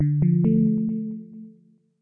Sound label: Startup, Operating Systems; GNOME Startup